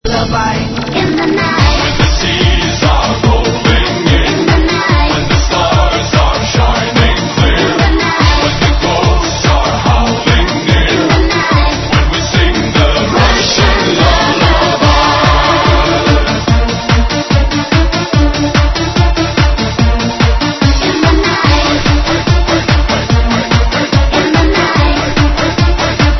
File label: russian lullaby